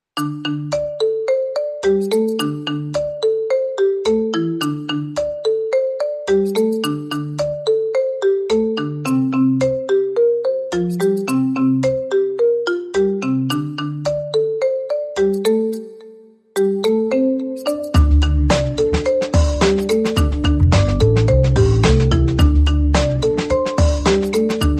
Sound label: Funky, No Guitar